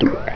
Slime Glorp; Assassin

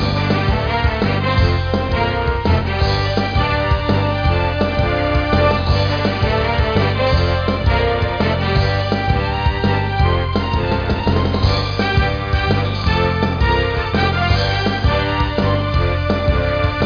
theme; NISSAN; cargo

